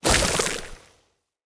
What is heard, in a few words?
Claw Proj Impact; Enemy; Corrupted Sproutfolk; Combat